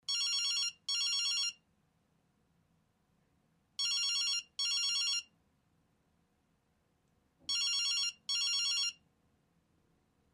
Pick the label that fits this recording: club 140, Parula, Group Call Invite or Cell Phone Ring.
Cell Phone Ring